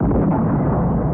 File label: lofi bomb blast; Weird and Interesting Hits; single hits